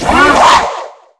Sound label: ATTACK